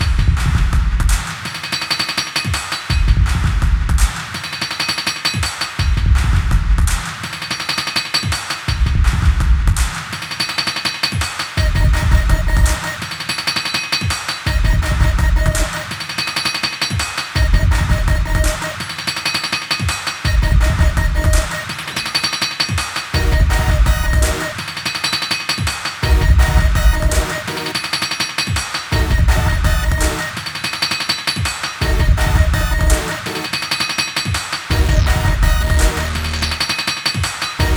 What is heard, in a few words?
witch house drum kit; battery 4 has a witch house drum kit